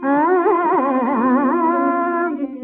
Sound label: Pantuvarali Shruti E